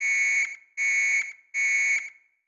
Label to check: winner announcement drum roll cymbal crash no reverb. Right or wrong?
Wrong